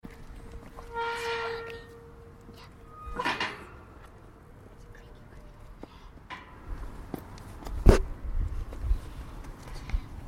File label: gate